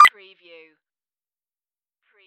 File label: input